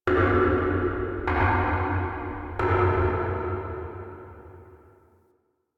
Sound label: Misc Knocks Small; Warframe; Ambience; Entrati